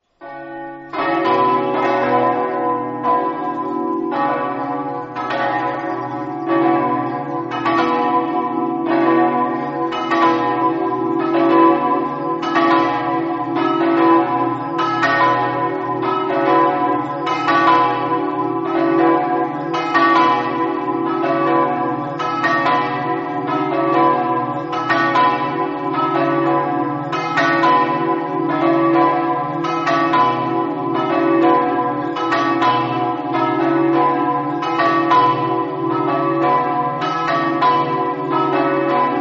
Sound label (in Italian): campane; sesto